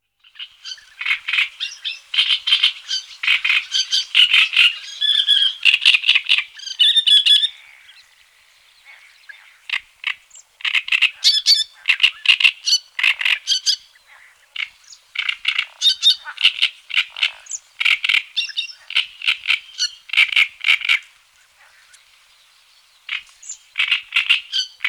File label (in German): drosselrohrsaenger, gesang; singvoegel; roche 2004